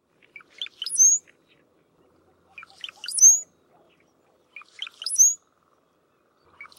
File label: brown headed cowbird bird call; iphone ringtone